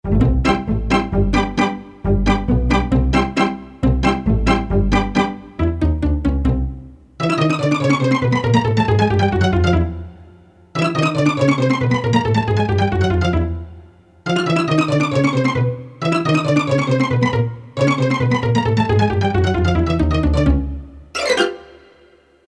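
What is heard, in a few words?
strings octave pizzicato; E-mu Proteus FX; Factory Presets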